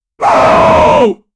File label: human battle cry sounds a; anger